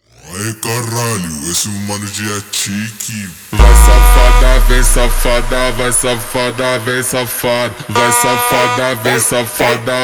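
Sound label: slowed, phonk music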